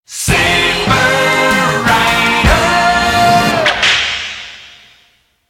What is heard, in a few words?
Commercial Bumper; Saber Rider and the Star Sheriffs; Dale Schacker